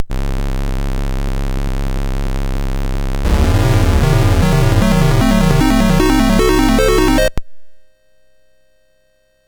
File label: Dragonworld sid MOS6581R4